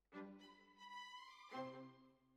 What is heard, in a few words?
Violas Over